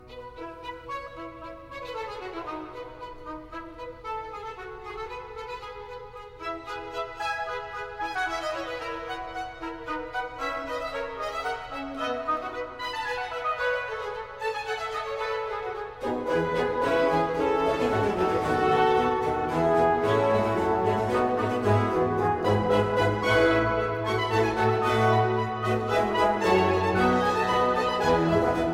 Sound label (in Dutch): Muziek uit de 17e eeuw; Handel